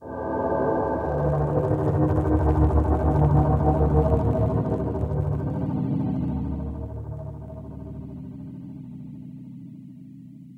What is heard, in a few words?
Ambient Pad, Drum & Bass Melodic Synth